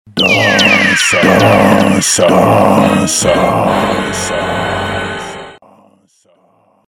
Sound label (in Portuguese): vinheta dança; CGMR PRODUCOES